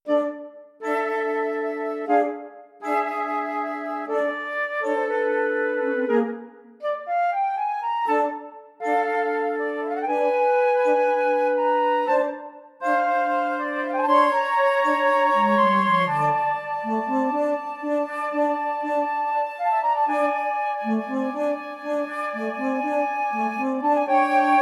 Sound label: Flutes